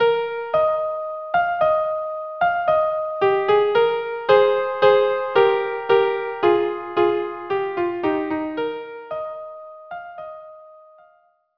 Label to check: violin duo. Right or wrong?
Right